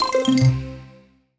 melody

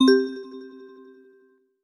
chat new message

